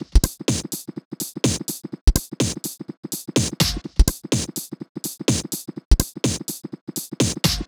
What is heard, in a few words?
125BPM TOP LOOP